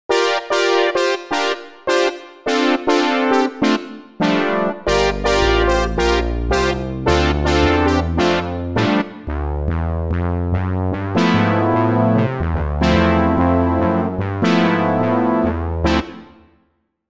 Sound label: Synth Brass, Jupiter8; OPXPROII; opxpro2